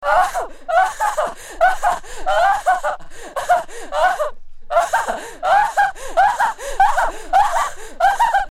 Laugh

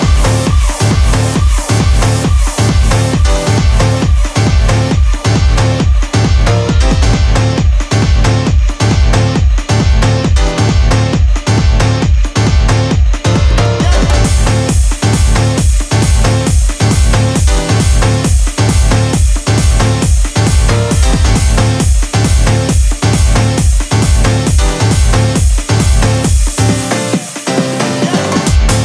Piano Bomb

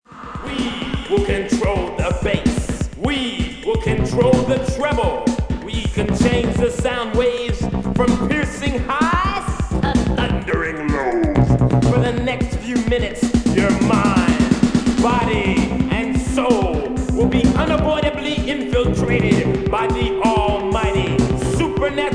tibetan jam (vocal)

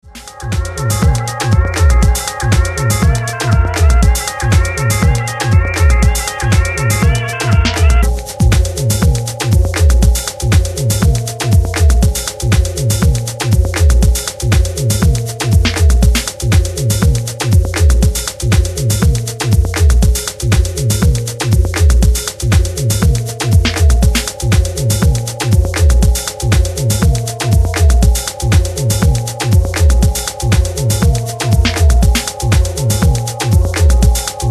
baby step (instrumental)

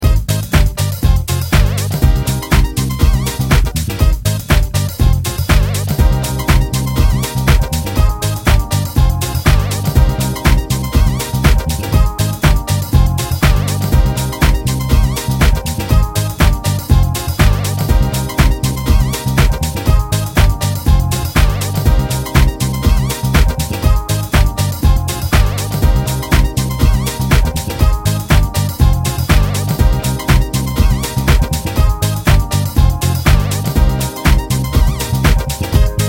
Dub Instrumental

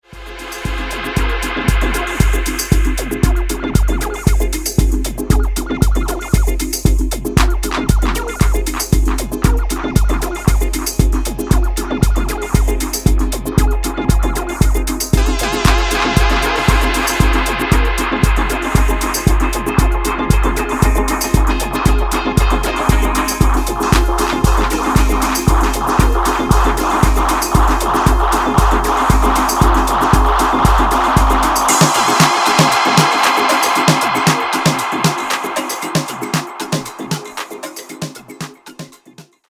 instrumental remix

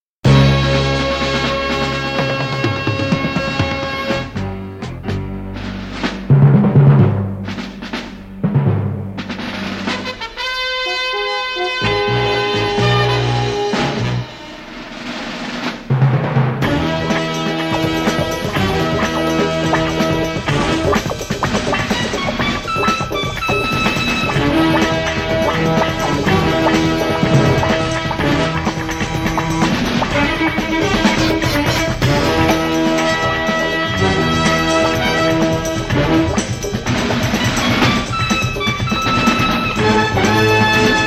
percussion fanfare

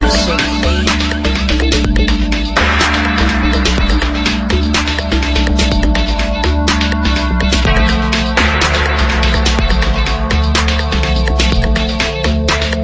the talking drums

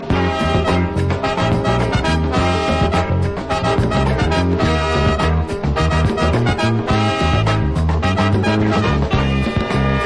Instrumental

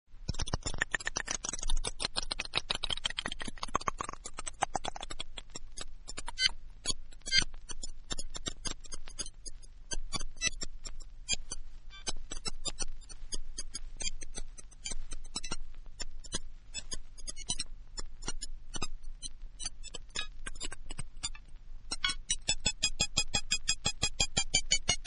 casio triangle through a granular synth

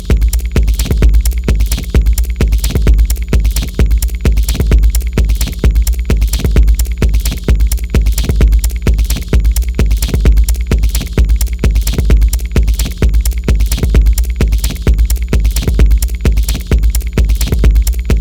808 workout

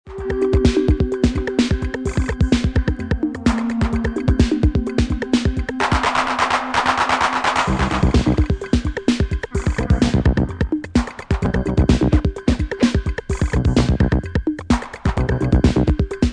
tibetan jam instrumental

